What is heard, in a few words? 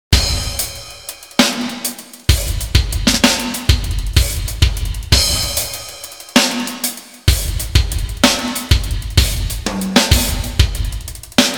verb test Dance Hall